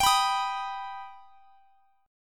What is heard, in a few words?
G Augmented G, chord; strummed